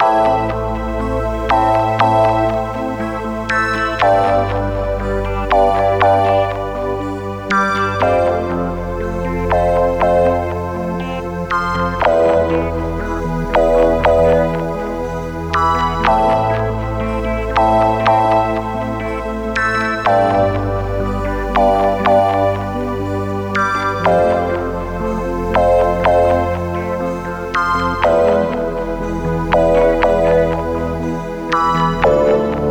Faltering Circuits, sci fi

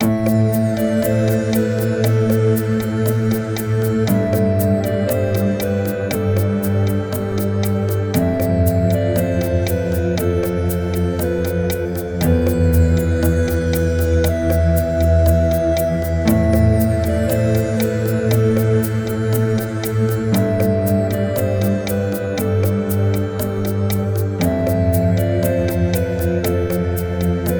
RPG Map Music, fantasy

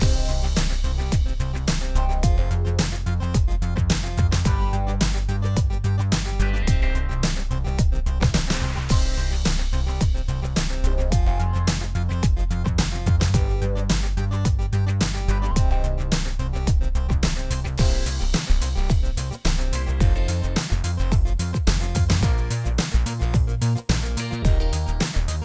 Boardwalk Arcade, chiptunes

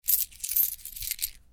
Coins

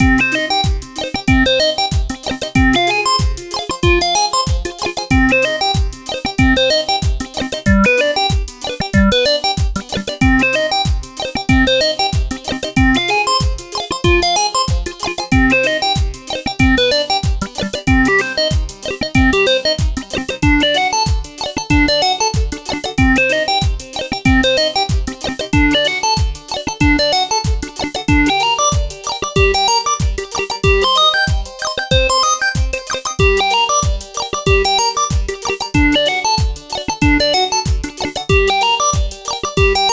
8 Bit Drama, chiptunes

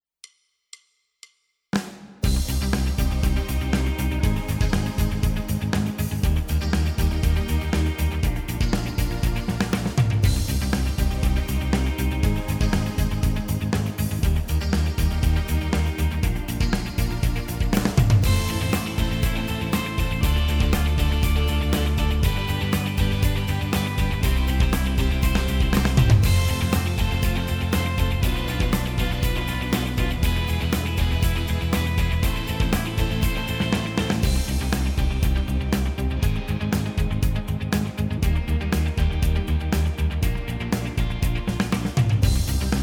80s Teen Melodrama; Rock music

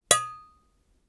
UI Quirky

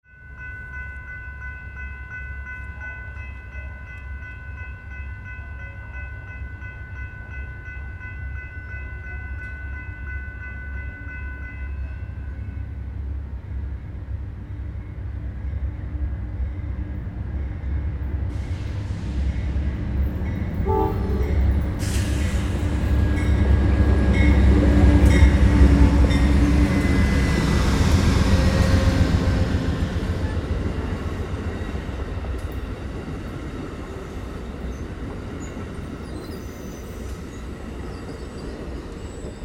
Passenger Train Arriving at Station

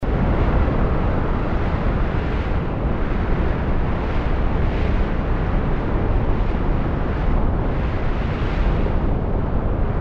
Wispy Wind

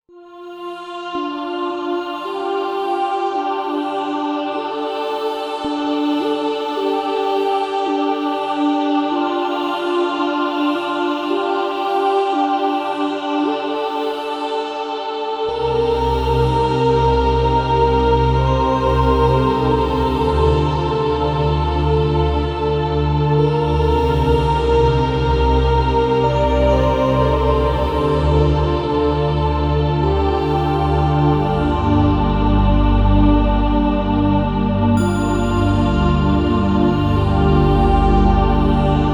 Some Faraway Place Looping; fantasy

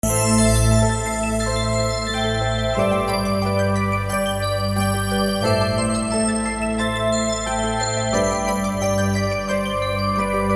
Looping

